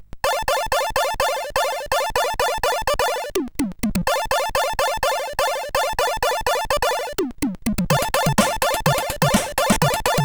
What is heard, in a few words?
techno, CSG8580R5